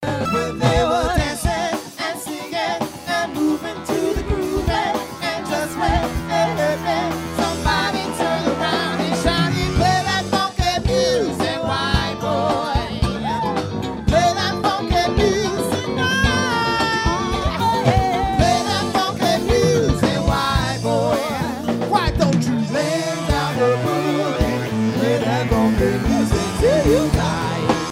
funky music

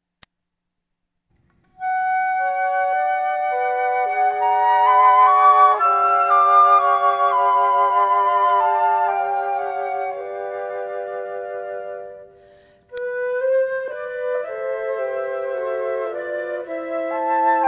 irish aire, flute

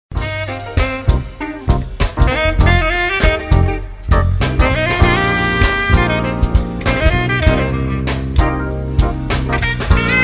Jazz

